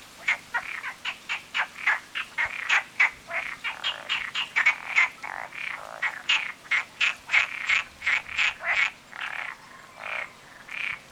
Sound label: Rana perezi